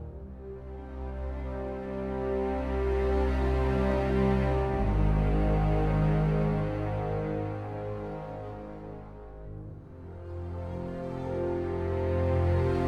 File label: music idle; doubledragons